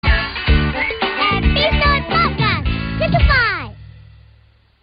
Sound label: funny kid; children